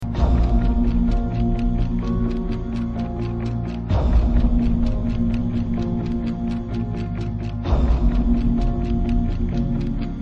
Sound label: Karaoke